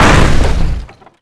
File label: Crash; FSR 2011